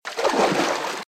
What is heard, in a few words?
Footsteps - Water - Shin Level; RadwinIsland